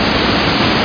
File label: AMB WATER FALL; franklins birthday surprise